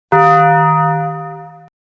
bong

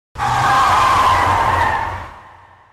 car brakes

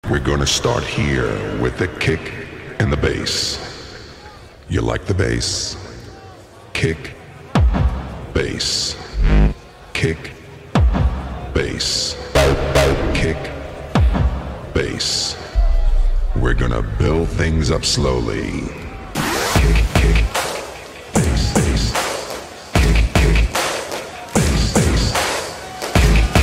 KICK BASS

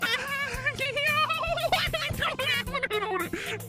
huhuhuhuh

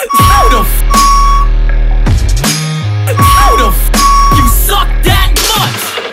sub tone; streamlabs

